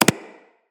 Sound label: Bullet Impact Generic